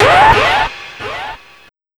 FX, Big Beat Drumloops; USB Soundscan vol.25; AKAI

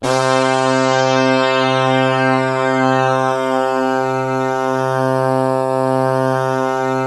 BRASS KIN, MORPHEUS; Syntec; Wall of Sounds

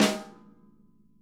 SNARE, Interactive Drum Kits

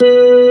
HAM B3, KEYBOARD; AKAI S6000 CD-ROM - Volume 1; Piano

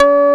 PAD C#4; Best Service Gigapack 1

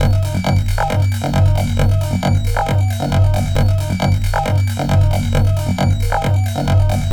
Drums Strange

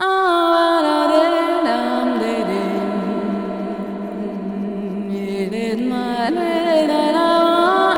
ETHNO REV, Funky Vocals; USB Soundscan vol.18

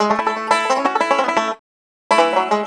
COUNTRY

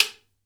GUIRO

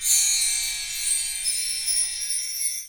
BELL TREE; 3D Sound; 3D Keys VOL-1

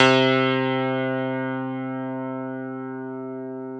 PNO, Electrics, Grands

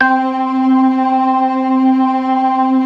ORGAN 4 DRAWBARS; Keith Emerson Signature Series

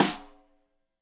Rim Shot Stereo; Deeply Sampled Drums; SMDrum

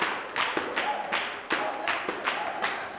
160 TAMB BRK, blues soul; Spectrasonics; Vocal Planet

